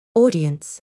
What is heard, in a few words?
audience